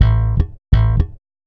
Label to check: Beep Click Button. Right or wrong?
Wrong